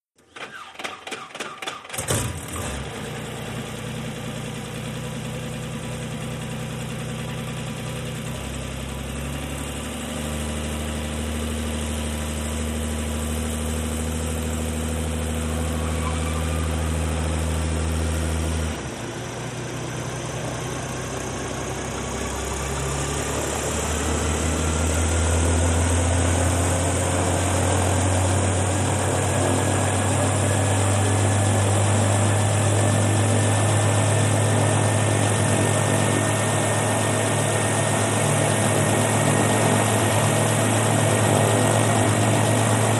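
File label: Bell 206 Strt Idl Awy, helicopter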